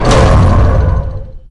shield wall; csgo; wcs go

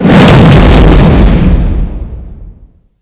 flame orb

